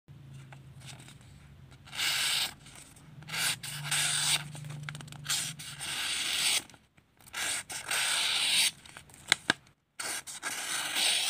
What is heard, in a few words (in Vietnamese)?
tiếng dao rọc giấy cắt giấy tờ